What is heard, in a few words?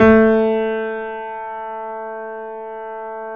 GRANDP ST A 2 F; USB Soundscan vol.17